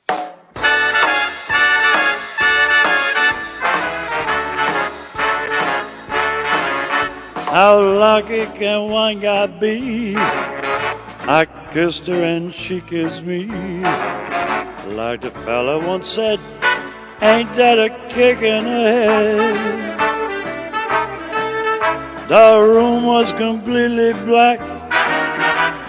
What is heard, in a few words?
kick